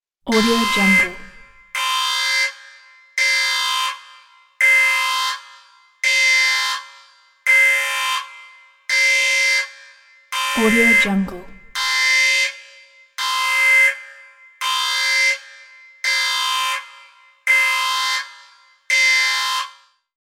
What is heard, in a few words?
spaceship alarm